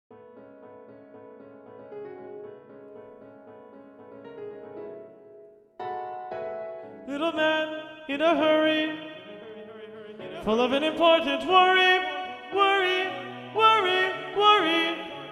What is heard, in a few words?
TTBB Tenor 2 Predominant; Mens